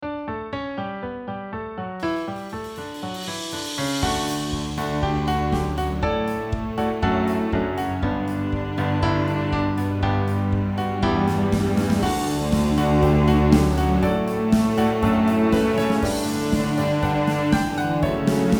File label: instrumental